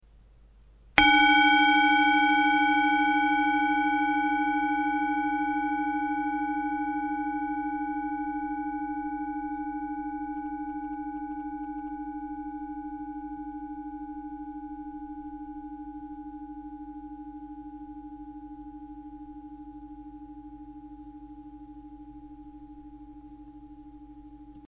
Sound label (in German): Klangmassage